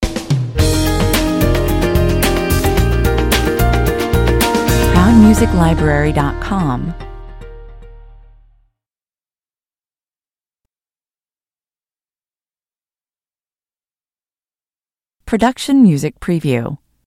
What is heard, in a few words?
bright and corporate stinger